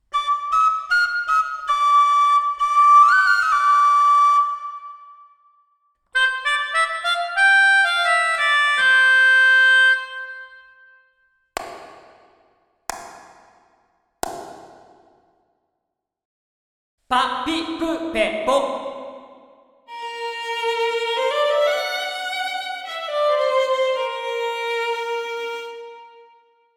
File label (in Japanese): プレート・リバーブ：Plate 2, Echoplate, 高域が明るく、エアリーな響きが特徴; EMT 140よりも後に開発されたEchoplate。Plate 1よりもモダンで、高域が明るく、エアリーな響きが特徴です。